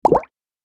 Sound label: Light bubble pop alert